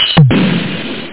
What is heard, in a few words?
Hat; Peg-It